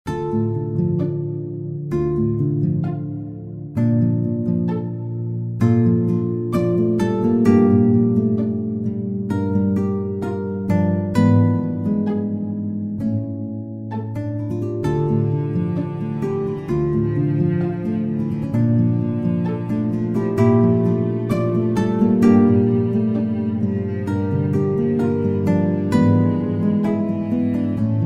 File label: Guitar, Orchestra